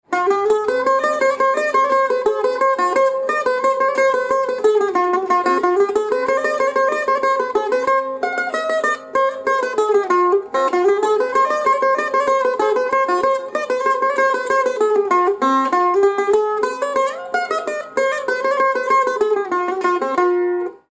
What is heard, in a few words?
tambura